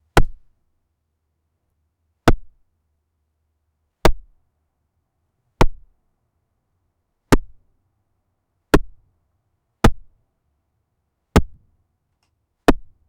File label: the impact sound of a, accidents